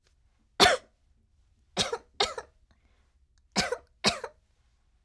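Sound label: voice clearing throat; sekibarai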